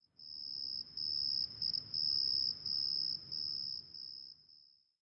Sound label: Cricket, terrain; Kings